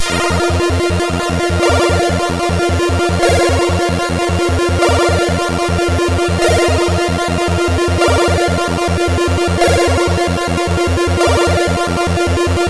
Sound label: Quartet, CSG8580R5